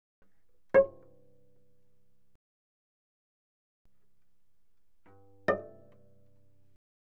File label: Cello pizz mf sul D C5 Db5; Strings